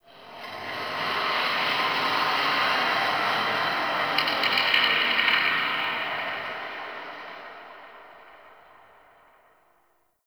Exterior Space Rings Textures, Ambience; Warframe; Railjack

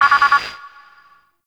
Interior Orbital Station Console Count End; Warframe; Tau

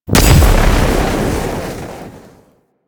Pipe Valve Explosion; Ambience; Warframe; Corpus Gas City; Gameplay Remaster

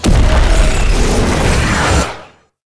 Brimstone Cast; Roathe; Warframe; Tau; Abilities

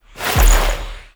Drifter Pull

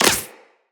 Laser Impact Flesh; Weapons; Bullet Impacts

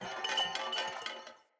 Corrupted Battle Dog Bell Tail Wag Idle; Foley

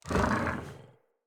Infested Charger Idle, Enemies

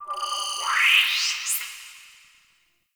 Class Lesson Slide; Warframe; The New War; Zariman Class Quiz

